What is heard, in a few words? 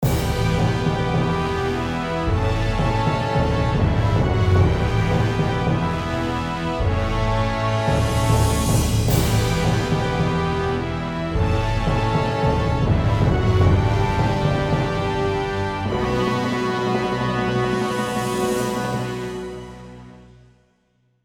Mission Successful Music - Allied